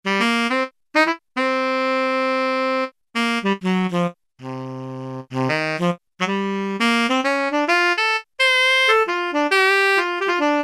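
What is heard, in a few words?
Saxophone Tenor 4 way; Brass And Woodwinds; Roland L-CDX-03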